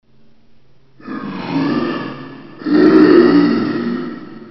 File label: zombie; dod